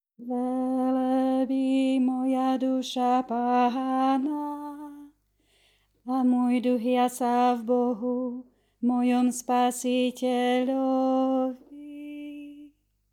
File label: Bass; Vianoce 2019 - Hľa Panna porodí syna; Farnosť Letanovce